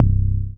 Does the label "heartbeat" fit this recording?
No